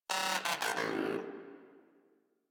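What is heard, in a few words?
Moa Aggressive Vocal Battle Ends; Warframe; Pets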